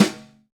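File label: Brush Snare alt; Snares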